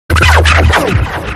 Drum and Bass Jungle, UK Bass Radio

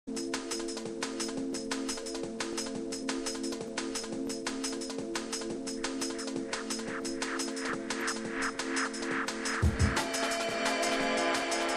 Drum and Bass Jungle; UK Bass Radio; The Rupture Sessions; Old Skool

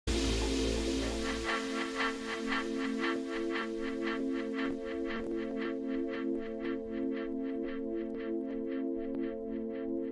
Drum and Bass Jungle and Old Skool, UK Bass Radio

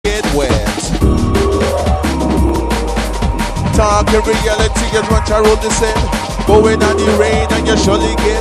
Bass Radio Drum and Bass Jungle; UK; LIVE; RUPTURE SESSIONS

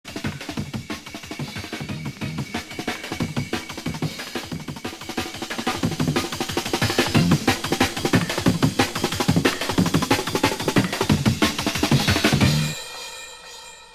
Drum and Bass Jungle, UK Bass Radio

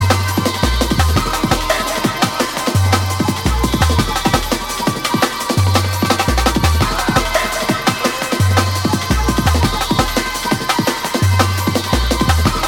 super sexy 93-97 jungle show

